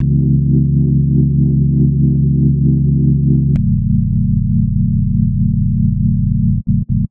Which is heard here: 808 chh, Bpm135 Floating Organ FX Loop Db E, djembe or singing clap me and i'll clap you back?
Bpm135 Floating Organ FX Loop Db E